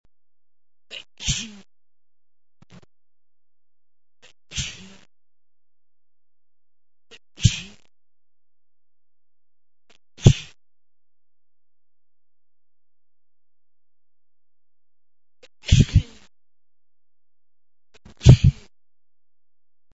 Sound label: sneezy, male